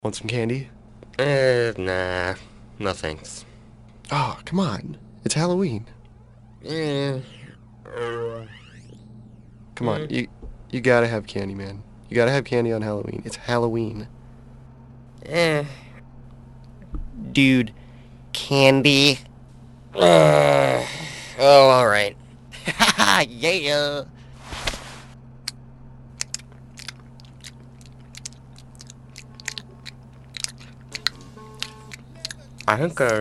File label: esoteric mix